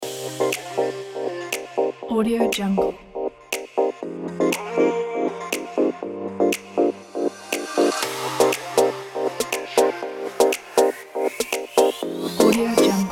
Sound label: upbeat lounge